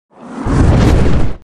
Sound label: Sfx Dark Lord Cast; Mighty Knight 2